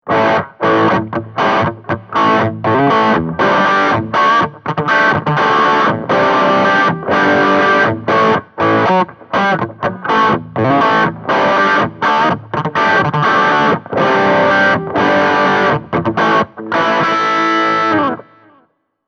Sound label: Peavey Vypyr X3 Heavy Crunch